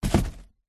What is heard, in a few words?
foley cardboard box set down on gritty hard surface; zapsplat